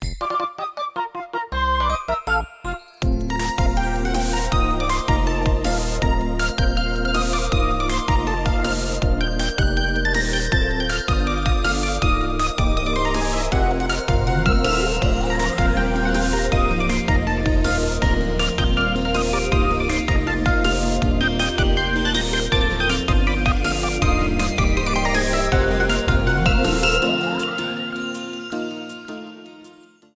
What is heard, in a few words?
Koopa Cape Underwater; Switch; Wii